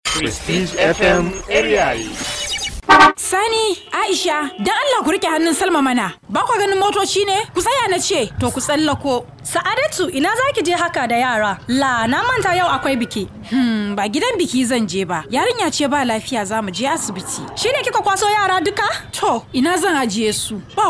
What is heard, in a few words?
jingle; Prestige FM